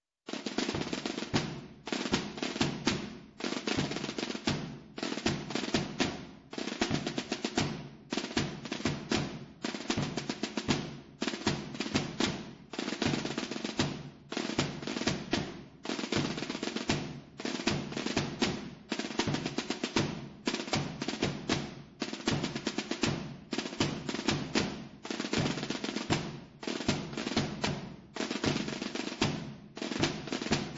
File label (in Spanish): calvario, tambores; ALBALATE; Subida al Calvario